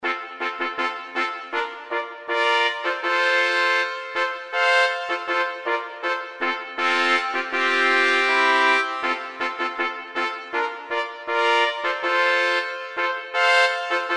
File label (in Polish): hejnal; brzesc